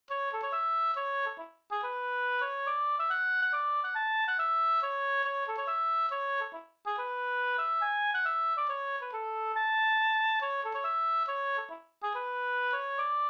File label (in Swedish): hambopolska